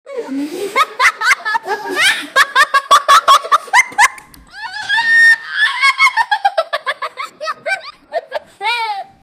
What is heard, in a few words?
kids start laughing in, corridor